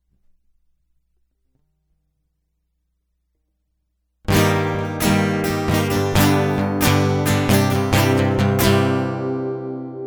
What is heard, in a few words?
Guitar Loop